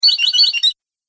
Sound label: Birdy Sound Game Fx, video game